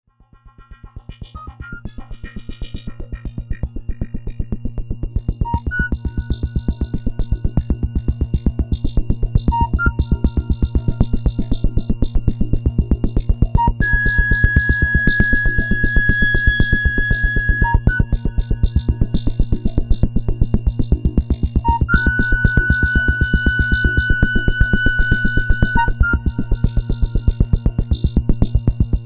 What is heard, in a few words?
JD CS60